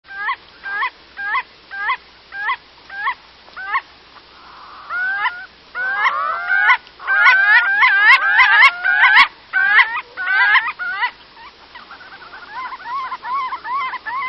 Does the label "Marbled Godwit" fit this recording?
Yes